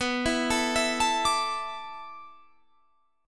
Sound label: D Suspended 2nd B; Dsus2/B; arpeggiated